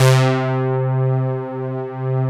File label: SYN, analog